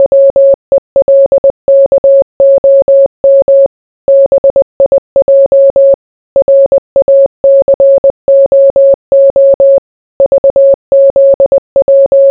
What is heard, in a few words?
morse